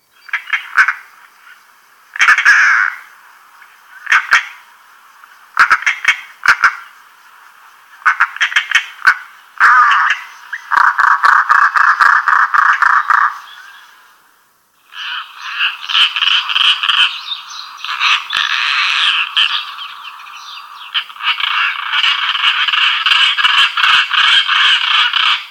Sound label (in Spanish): carraca